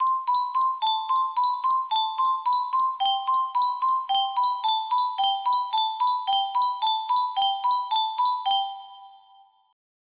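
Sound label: music box melody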